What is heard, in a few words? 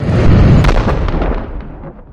strike; tyrants edge